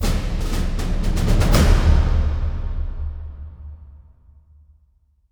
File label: Sting Solve Puzzle; Captain America Super Soldier; NDS